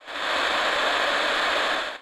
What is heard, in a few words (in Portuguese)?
radio